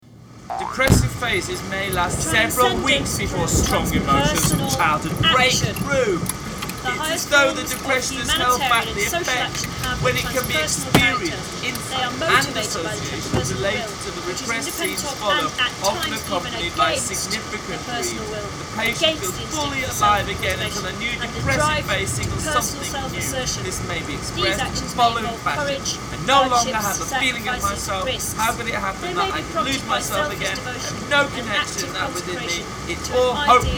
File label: Backing Track